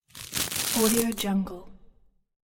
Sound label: objects household brush handle; blazznet